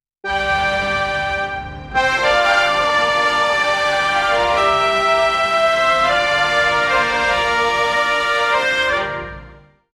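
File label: royal presidential music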